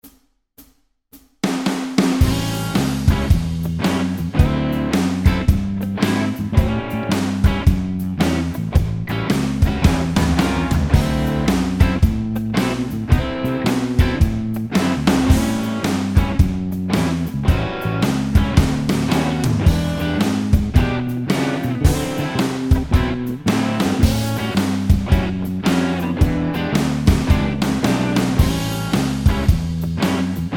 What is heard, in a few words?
BluesRock Soloing 12 Bar Blues Backing Track A7